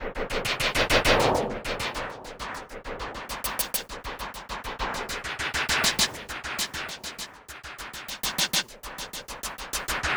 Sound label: UNDULATER; Distored Reality